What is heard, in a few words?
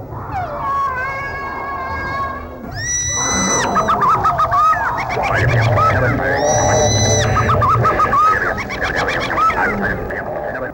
Jungle